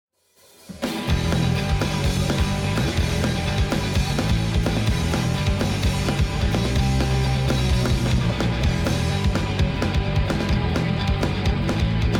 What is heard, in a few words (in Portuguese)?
metal ao vivo; no St. Paul's pub